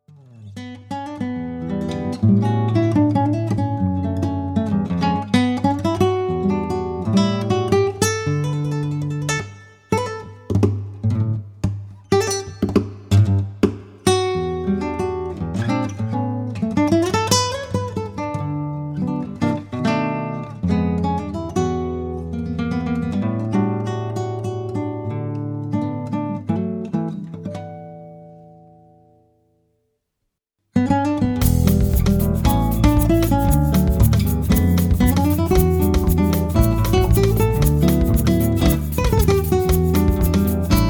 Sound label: rabbit samba